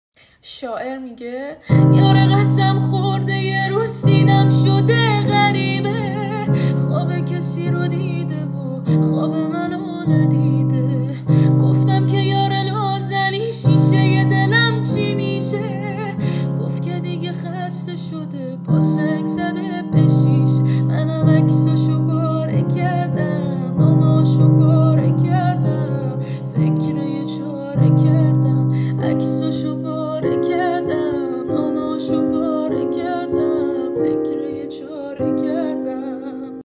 Women Vocal, Guitar

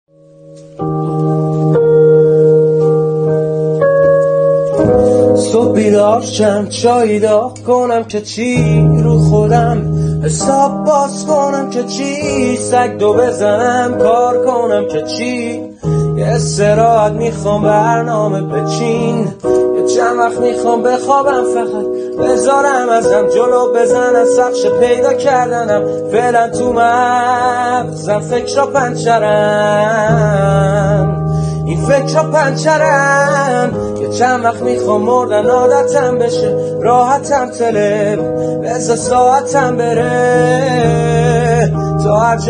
Piano Version

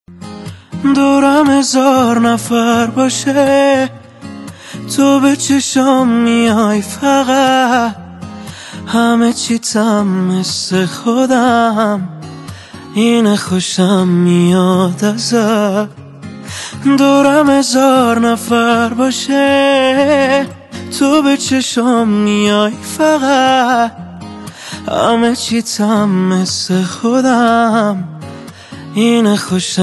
Guitar

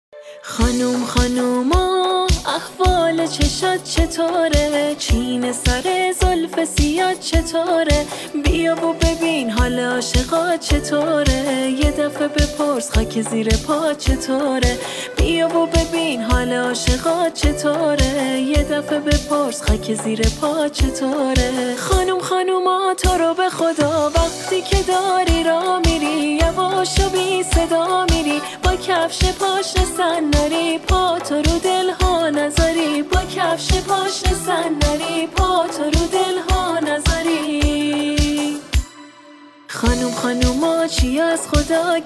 woman vocal

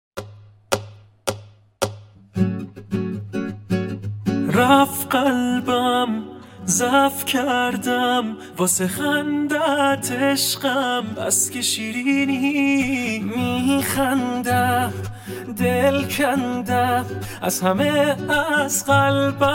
Remix Guitar

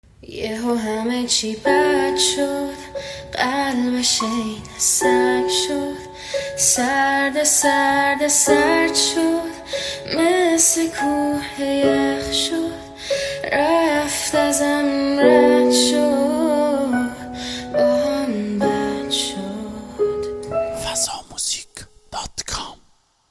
Vocal Piano Version

